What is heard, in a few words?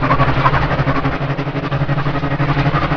chop loop